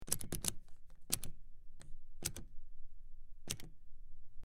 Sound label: Button Press In Out, switch clicks